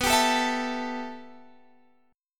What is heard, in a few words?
B 7th Suspended 4th Sharp 5th, Guitar, Open F; B7sus4#5; strummed